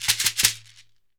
SHEKERE SH, Synclavier Percussion; ILIO; Synclavier Percussion 2; World & Orchestral

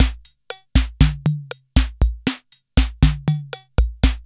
SAMBA; INIS - Funky Xtreams; KR55 LP2

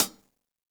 hihat - closed; JazzFunkKit

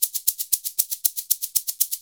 SHK, Shaker; Univers Sons; Basicussions

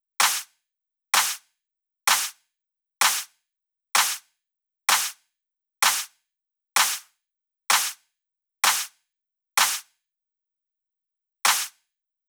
Drum Loop 128BPM Clap; VEC5 Drumloops; VEC5 Multi Loops 128BPM; Loop Elements

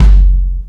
Kick, Kick One-Shots; KJ Sawka - Drum n Bass & Beyond; Drum Tool Box

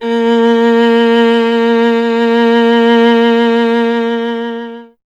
STR VLA, Viola; Miroslav Vitous; Solo Instruments VOL-1

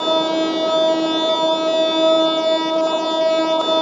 WEIRD BELL; AMG - Vince Clarke Lucky Bastard

